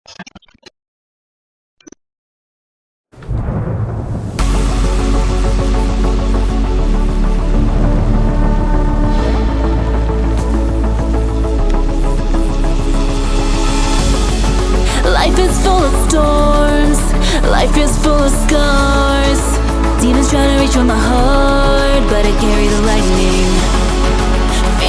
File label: Thunderstorm